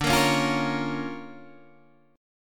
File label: D Minor Major 7th Sharp 5th, D, Guitar, Open D